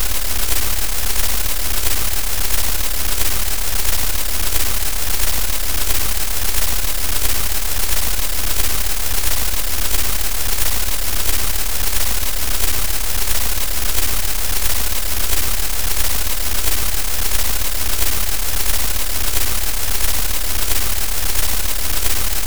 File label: sonification